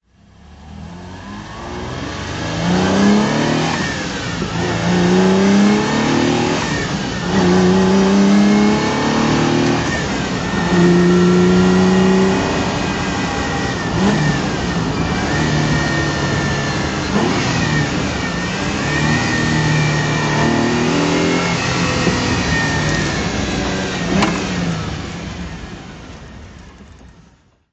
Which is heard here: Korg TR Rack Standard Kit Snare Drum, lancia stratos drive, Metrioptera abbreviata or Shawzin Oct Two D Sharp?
lancia stratos drive